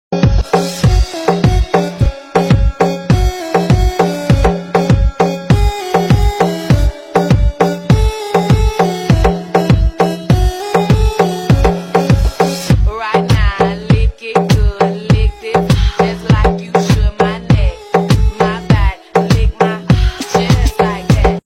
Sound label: Party Music Ringtone; ringtones